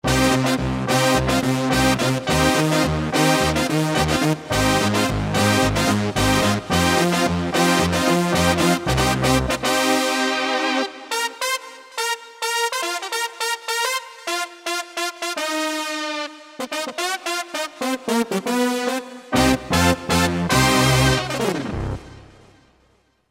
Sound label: TRANCER BRS; Akai SG01v